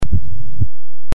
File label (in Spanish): aortico